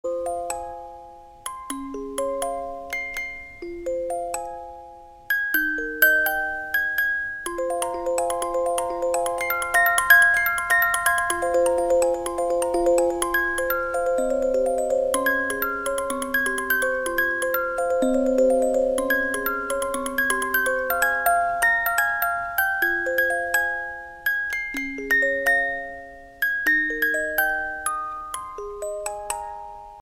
Music Box

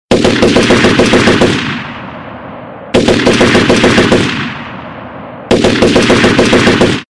Gunshots

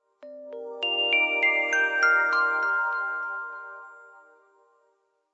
Sms Shine

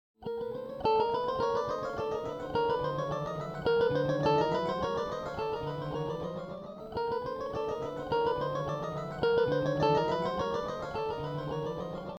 Guitar up and down

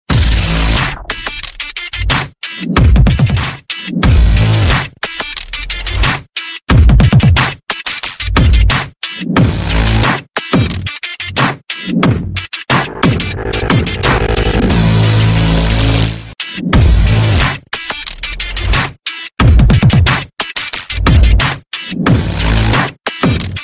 Hip-hop Beat; Dookudu

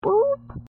boop

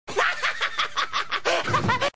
Horohoro laugh

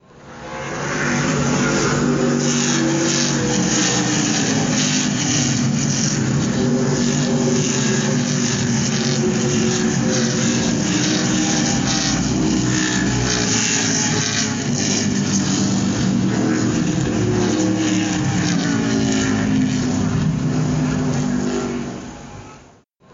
NASCAR Racing

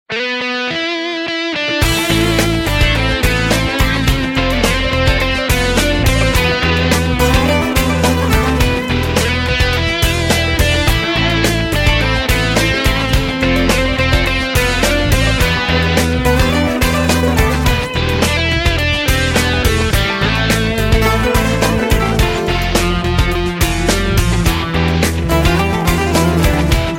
anatolian rock

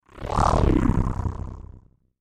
Alien Growl

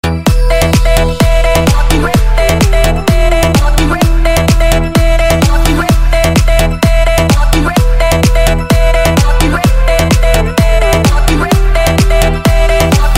Techno Mix

